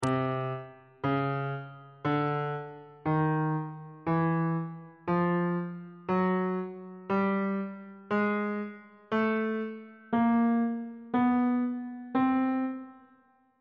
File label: d double flat chromatic scale 1 octave of notes; bass clef